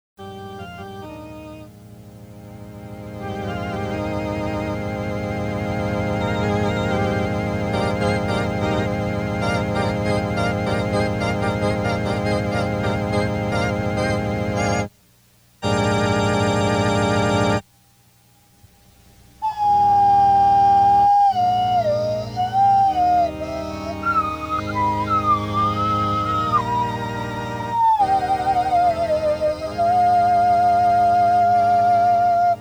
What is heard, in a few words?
Mouth Cavity Oscillator With MKI Touch Sensitive Organ; Le Caine Hugh